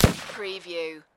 Rifle Thompson Center Encore 7mm Single Shot Exterior At 45ft